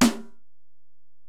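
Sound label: Acoustic Snare, Alesis DM-PRO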